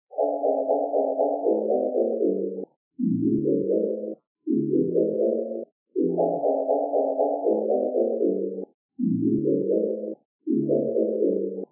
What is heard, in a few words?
elise ethereal